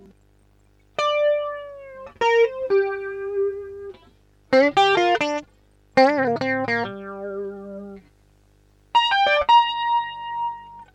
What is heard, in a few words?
Shine Lead, flange